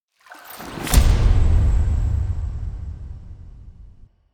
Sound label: Spear Slam; Garren Quest Intro; Deora